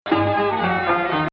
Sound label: Rast Nahawand